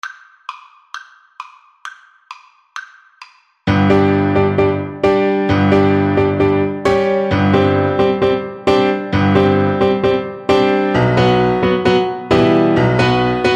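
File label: romanian folkdance VLC karaoke, Cello; bartok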